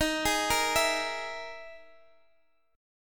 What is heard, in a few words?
E Major 7th Flat 5th